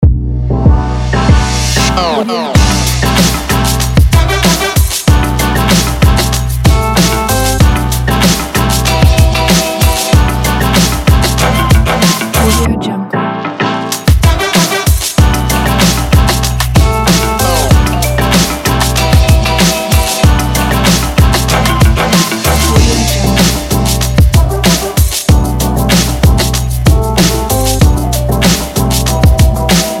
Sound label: a hip hop